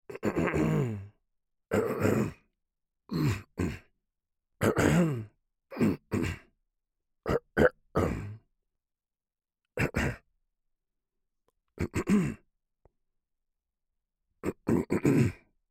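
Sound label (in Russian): zvuki pershenie v gorle kkhm, sounds tickle in the throat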